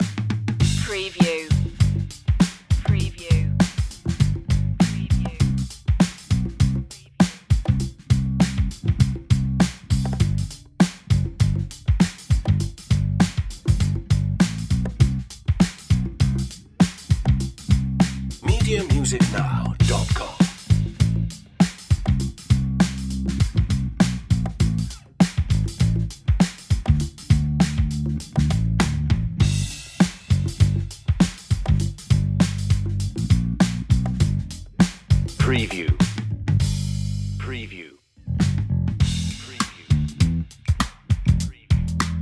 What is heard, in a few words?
easy funk comedy drums bass; 70s retro